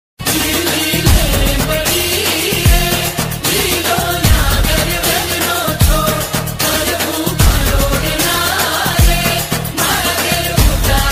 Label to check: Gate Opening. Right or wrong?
Wrong